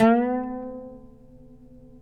PLK OUD, Roland LA Composer

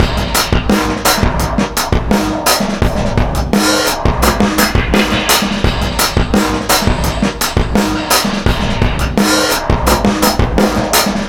Pump Action 85 bpm, breaks; Zero-G Beneath the Planet of the Breaks

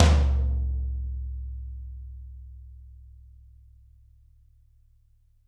Toms, Drums; Bob Clearmountain Drums I

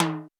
Roland U220 Dry Set

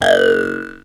tuners

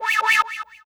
Synth Hit A; Zero-G Trance Inducer; 90 s Sample CDs

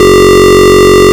rave beep G1; Best Service Alpha Dance vol.2

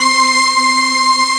organ, elixia organ; Best Service ProSamples vol.10; House; AKAI

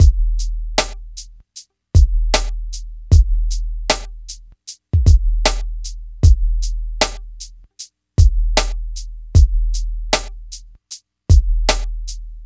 Hip Hop and R&B Drumloops; Best Service; ProSamples vol.01; AKAI

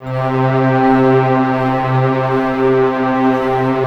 PAD, 170 BPM, Drum & Bass; Ueberschall; Drum & Bass Flash Trackz

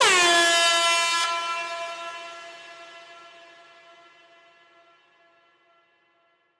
Air Horn; Electronisounds

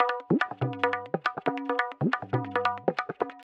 tabla, 140 bpm; Zero-G; Pure Tabla

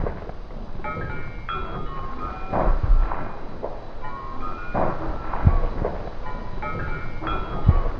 FX Ambient; Drums & Drones

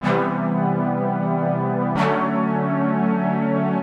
Synth Loop (125BPM), retro house

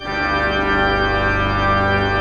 PED, Pipe Organ; E-MU Producer Series Vol. 2 – More Studio Essentials; Keyboards

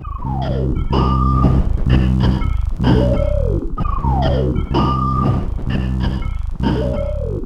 C1, Synth Line; NiceBeats

